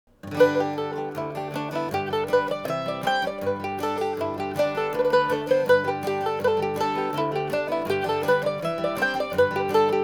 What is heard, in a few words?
guitar